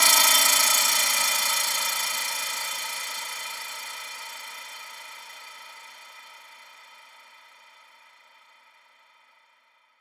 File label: fx downer chirp